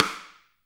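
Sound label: Snare Drum Rim; Best Service Gigapack 2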